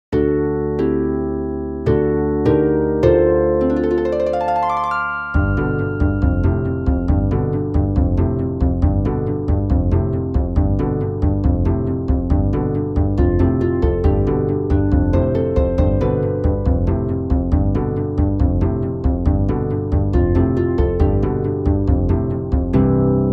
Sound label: Backing Track C major; Legend of Zelda OoT